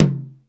DRY TOM H, DRUMS; East West - Global Sounds

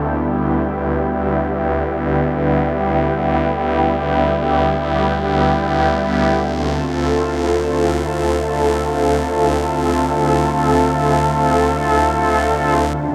Oceanic, Pads; Sonic Foundry (Sony Creative Software); Synchro Funk

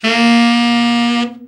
BRA TENOR OB, SAX

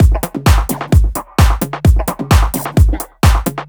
Mono Phunk, Drum Loops, 130 BPM; Underground UK House & Electro

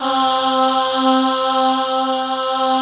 GIRL SH, FAIRLY VOX; Synth

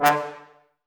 BRS TBS D, Woodwind Brass Ensemble, Brass; Miroslav Vitous - Woodwind Brass Ensemble

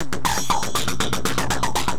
Throatbeat

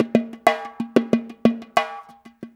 Mondo Head, Brushes, Perc Loops; Sonic Foundry; Loops & Samples Drum Tools

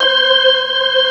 C5, Keyboards Old School

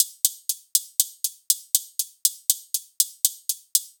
Hi-Hat, EDM; Sonic Foundry (Sony Creative Software); Afterhours EDM; Retro Active

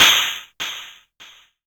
hi hat closed, Korg ER-1; Kit 14

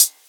Closed Hihat, House; Drums; Cymbal Stuff

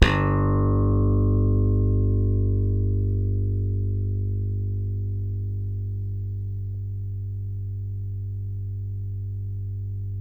SLAP BASS, Electric Basses

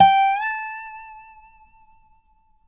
DOBRO UP, A5F

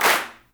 CLAPS DRY, HANDCLAPS; Spectrasonics; Heart of Africa

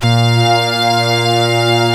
ORGAN, A#; Big Fish Audio; Synclav FM

